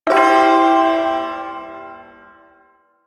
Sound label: Ersatz Shawzin Pent Maj Chord; Warframe; Duviri Ersatz Shawzin